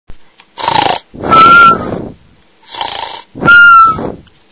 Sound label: SNORE; EDGE